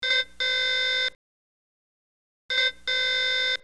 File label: critical, computer